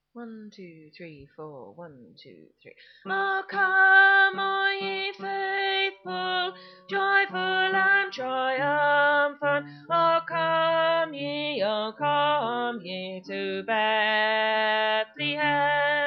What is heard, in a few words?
Bass